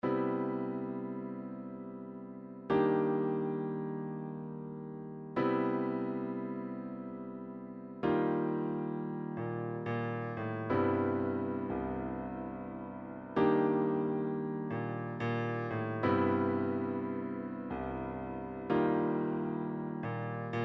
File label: triphop; Newtronic Triphop Construction Kit